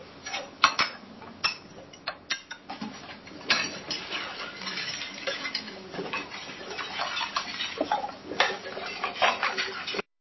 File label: glasses clinking